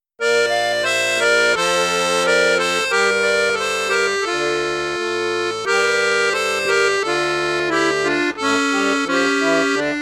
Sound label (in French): registre flute juste, accordeon; piermaria 318 l